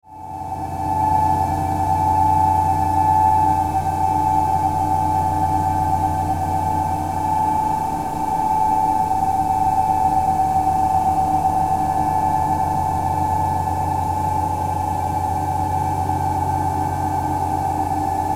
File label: DRONE DARK WAR, Drone Dark Warm Airy Resonant Ring Swells; Blastwave